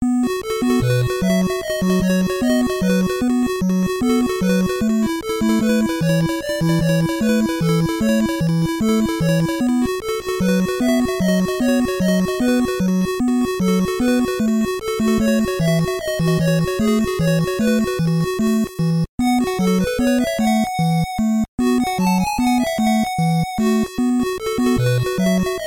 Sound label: Tetris Music